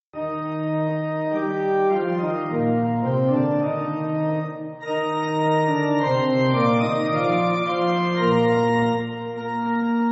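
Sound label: Eb, Pipe Organ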